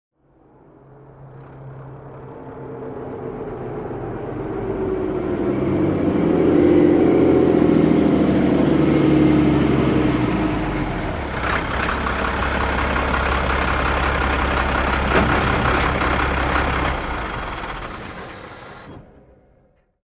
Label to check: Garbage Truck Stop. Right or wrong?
Right